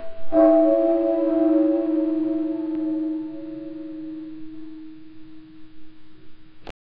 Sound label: temple bell distortion; ritual